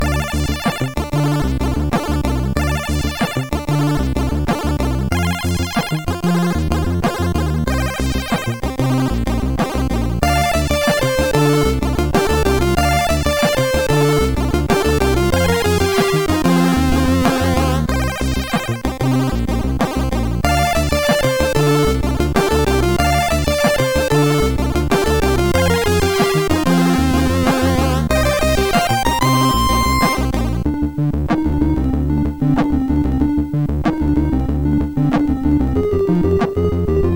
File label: sid, MOS6581